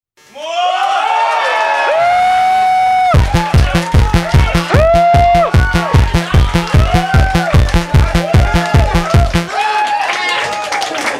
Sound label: tuning